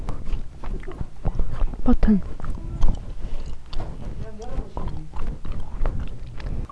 button

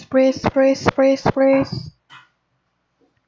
breath

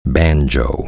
banjo

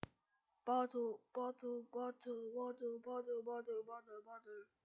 bottle

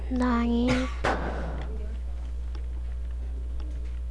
knife

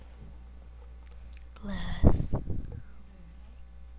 glass

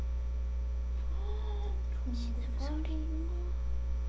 groan

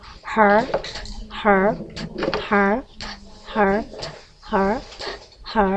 harp

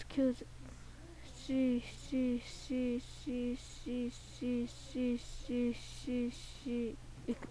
sea

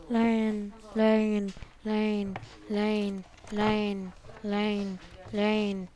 rain

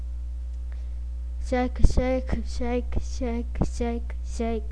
shake

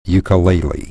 ukulele

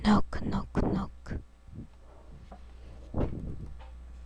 knock

